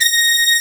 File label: Brass C5